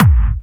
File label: hard bass drumz